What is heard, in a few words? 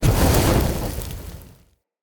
Fire Pillar Ignite